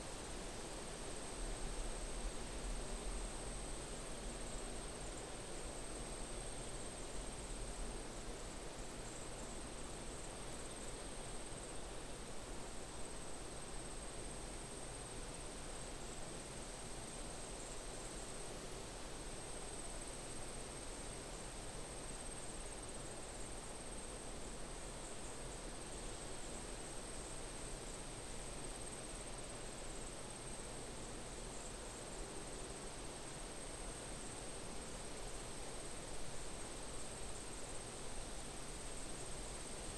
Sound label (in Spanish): AMB RURAL NIGHT TURISMO; SIMUZENSOUND